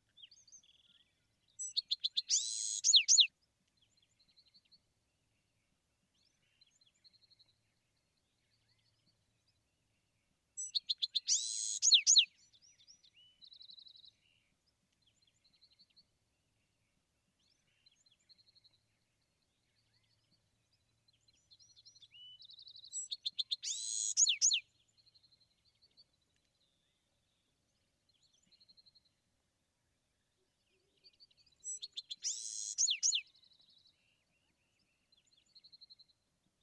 Bewick's Wren

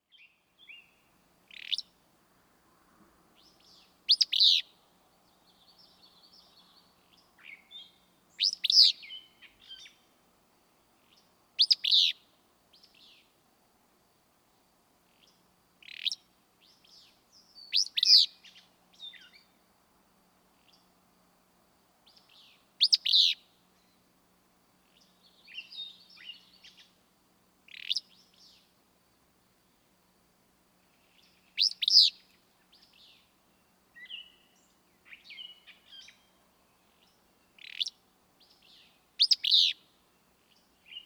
Willow Flycatcher

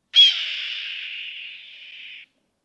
Steller's Jay

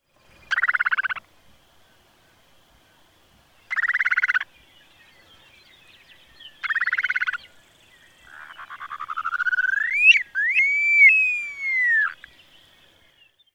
Upland Sandpiper

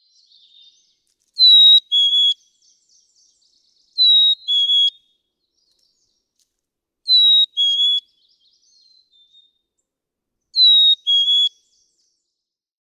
Black-capped Chickadee

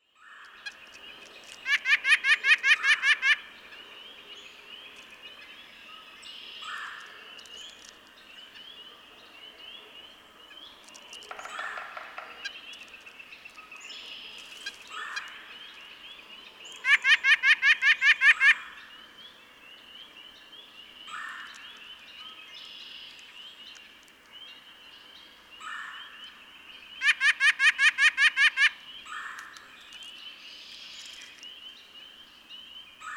White-breasted Nuthatch

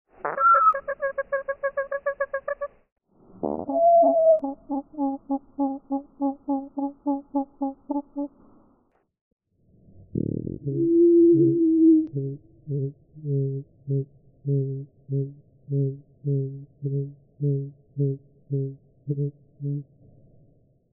Anna's Hummingbird

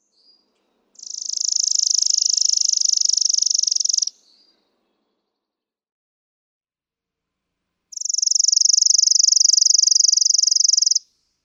Chipping Sparrow

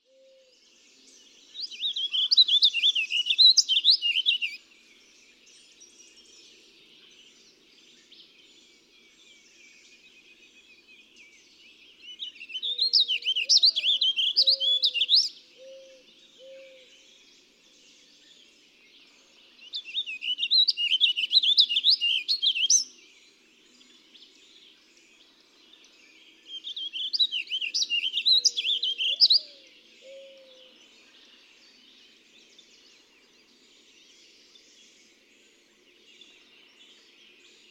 Warbling Vireo

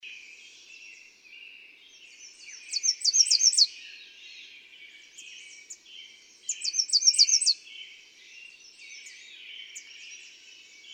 American Redstart

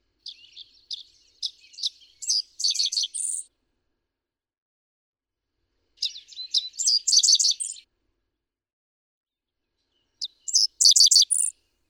Dickcissel

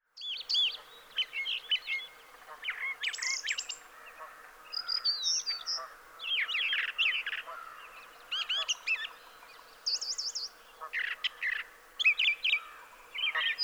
Brown Thrasher

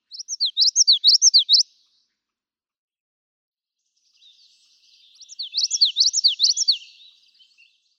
Common Yellowthroat

